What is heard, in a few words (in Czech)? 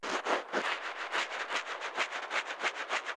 tužka; Mafie 1